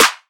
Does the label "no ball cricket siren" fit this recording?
No